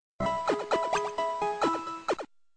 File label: healing pokemon sound